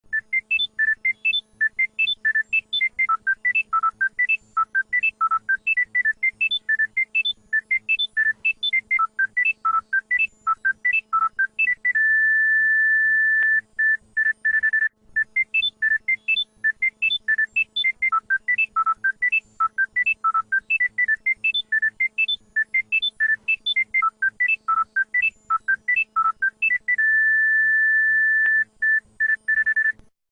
panasonic eski telefon melodisi

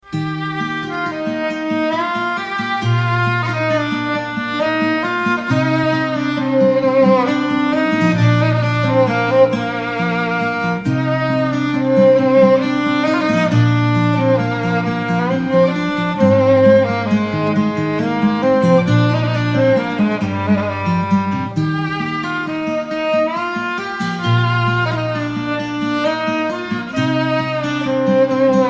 garip zil sesi keman; Keman İle Çalan; Kemal SUNAL

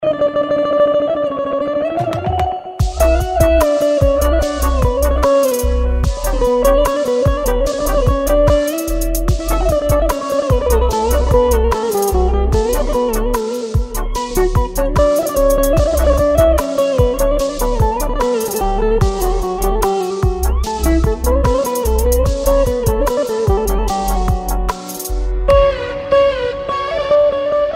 baglama zil sesi; bağlaması ile modifiye edilmiştir

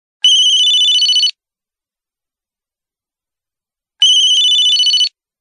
ericsson eski telefon muzigi

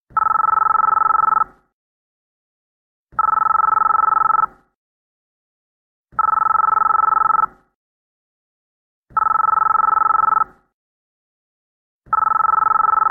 eski klasik zil sesi; 8110 Zil Tonunun Bir Benzeri

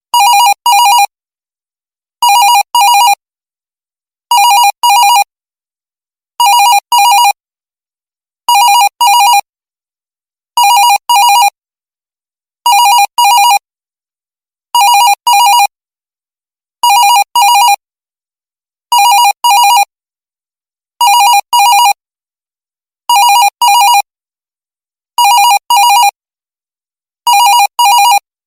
ofis telefonu, cep telefonu melodileri ve bildirim sesleri; Klasik Ofis Telefonu Zil Sesi

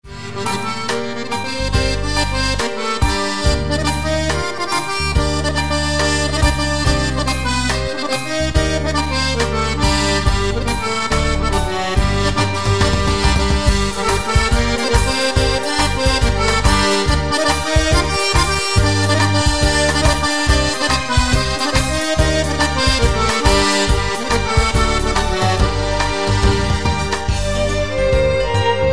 cerkes zil sesi; Adige Çerkes Kafkas Melodi; Yazı sahibi Çerkes olur da Çerkes melodisi olmazsa olur mu

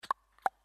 begenme sesi

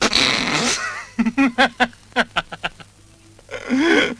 gulme; kemal sunal

